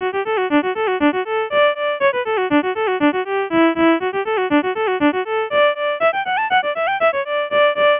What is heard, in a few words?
saw